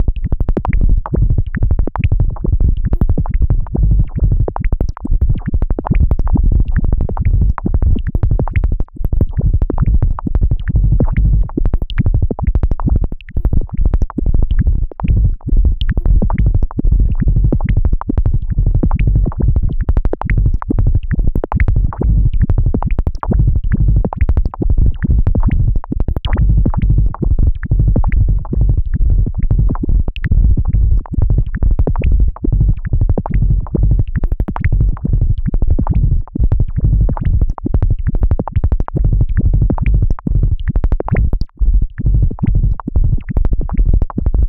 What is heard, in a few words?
kick explorations